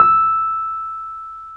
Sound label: PNO, Wurly; Roland LCDP10 Keys of the 60s and 70s 2